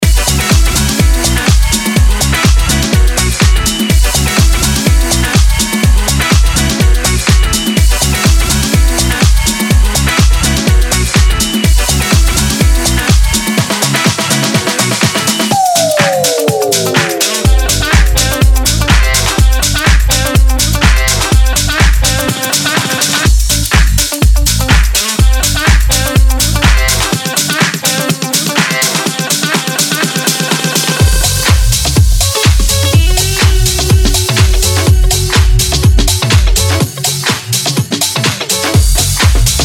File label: House Guitar Essentials; Toolroom; Alex Preston